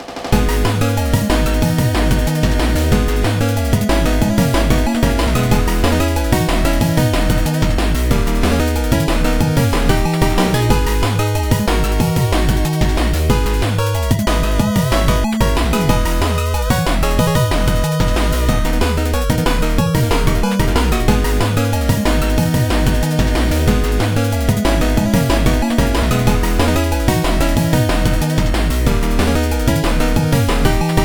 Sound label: Trap Tower; Jet Set Knights; PS Vita; PS4